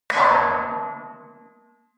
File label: metal impact bar bell large bright